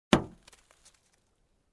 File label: Handle snapback